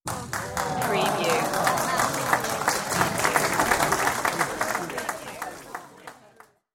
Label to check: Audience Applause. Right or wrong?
Right